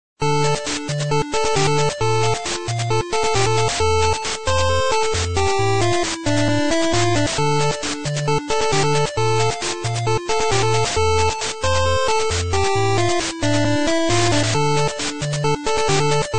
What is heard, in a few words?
Epic Sax